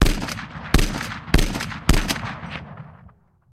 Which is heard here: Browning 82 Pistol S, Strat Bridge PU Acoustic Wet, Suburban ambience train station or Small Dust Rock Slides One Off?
Browning 82 Pistol S